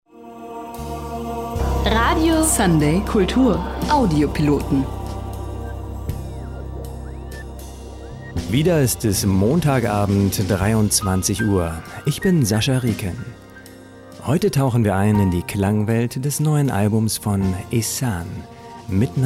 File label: Jingle Radio; SR